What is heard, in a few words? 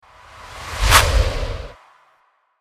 Clap ACCOMP; unfreeze